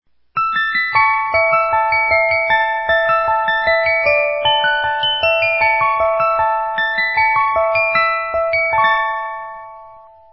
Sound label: sankyo